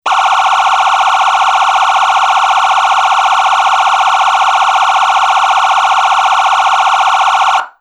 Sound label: phaser, siren; sa441M